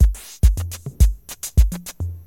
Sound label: cold breath, Drum loops Electro